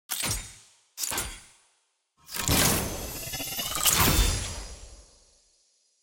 loot hextech egg open default; lol

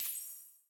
ranked ui lp increase tick; lol postgame

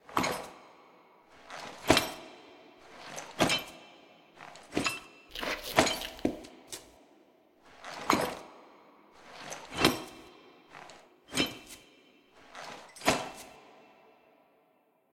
walk lunges

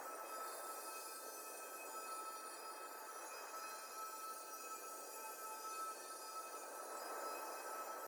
ambience loop; honor level 2

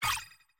ui opponent story

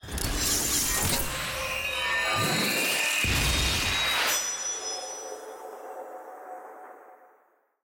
orb upgrade; clash; lol

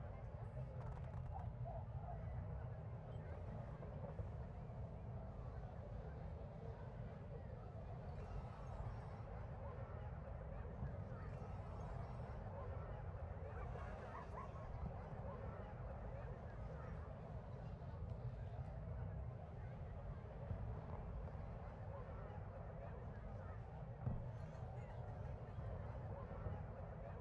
amb market ground muted; tft lny2022

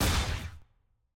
aah; sett

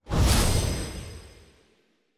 loot open worlds large orb intro; lol

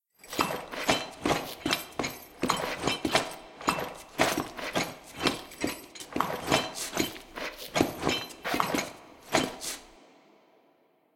dance

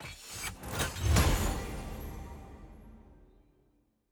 portal open intro; loot

